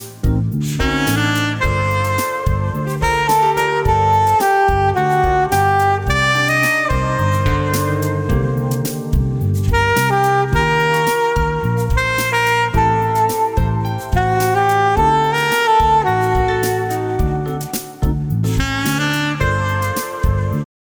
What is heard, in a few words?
alto vals boston, sax